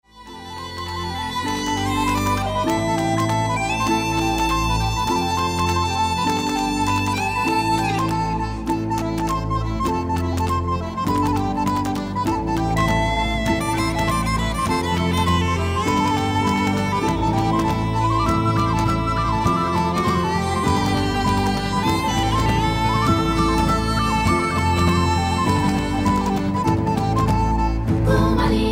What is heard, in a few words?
Drone; Saint Declans